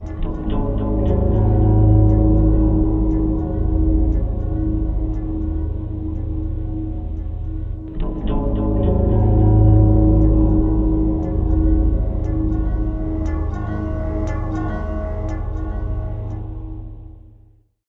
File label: stringed gongs planet; Pads